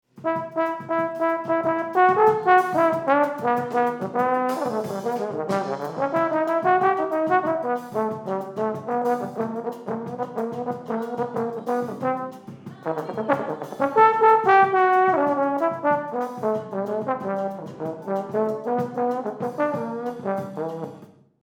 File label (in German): Posaune EMT250